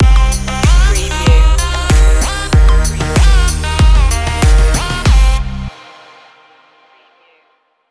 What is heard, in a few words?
Cool, upbeat tropical pop track with catchy synths, mid-tempo; dance; club beats